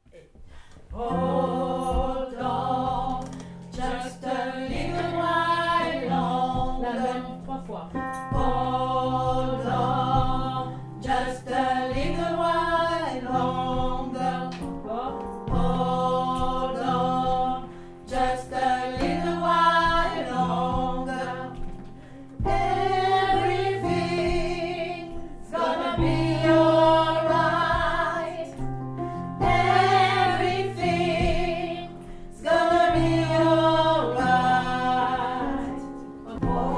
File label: mezzo, gospel; Hold on